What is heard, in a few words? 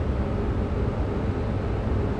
ambi; Mafie 1